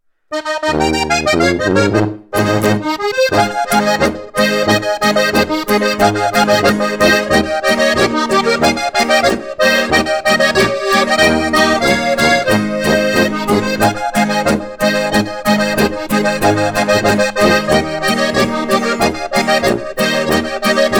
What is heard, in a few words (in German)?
Boarischer; Rucksack